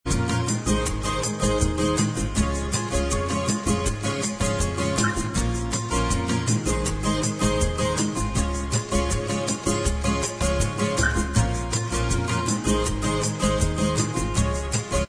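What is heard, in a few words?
bossa Instrumental Track, backing tracks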